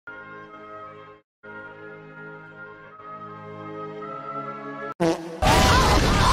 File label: house exploding